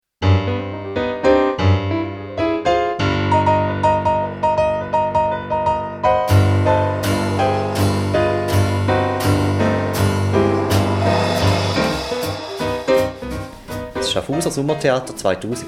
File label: Jingle